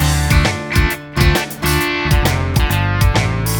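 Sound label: country, ringtones; Backroad; Google; NewWave Labs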